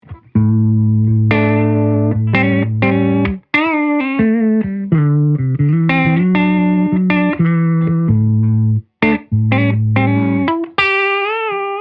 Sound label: Guitar Mid; HiWRP